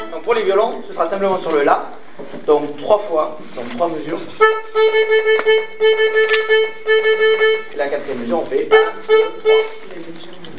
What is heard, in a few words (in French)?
Decomposition intro rythmique violons